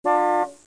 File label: CAR HORN; Comedians - The People Behind the Laughter